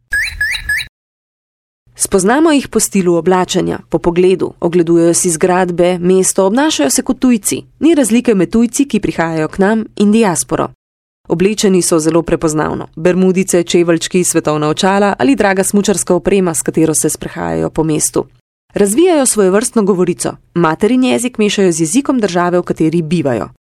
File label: jingles